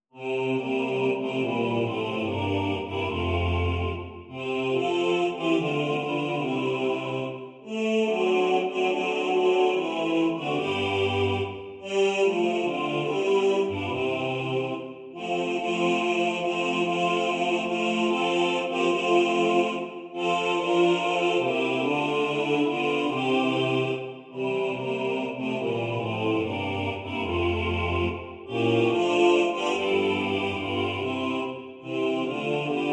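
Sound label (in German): Bass 2 im Vordergrund, Männerchor; Ihr Hirten seht zum Himmel auf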